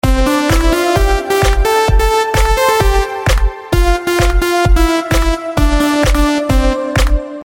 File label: children song remix